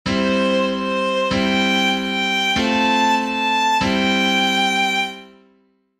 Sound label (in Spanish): Armonia